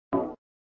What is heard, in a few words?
Sound SFX, flipper